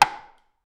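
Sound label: simple game wood dart shot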